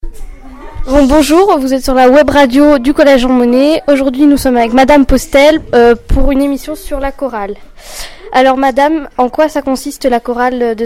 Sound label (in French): chorale